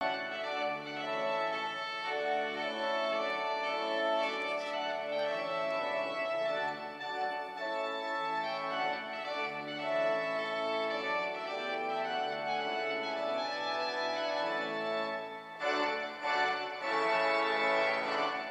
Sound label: trumpet sortie